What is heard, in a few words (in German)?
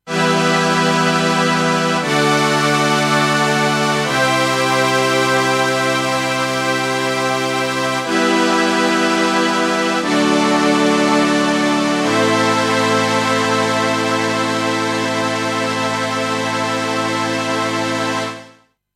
Vintage Stringer ohne Effekt